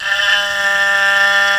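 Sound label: haegeum G2, Korean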